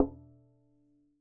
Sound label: Violin, Instruments; Convolution; IR Samples; NI Kontakt 5